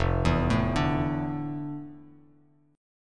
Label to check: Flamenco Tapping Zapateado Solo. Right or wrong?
Wrong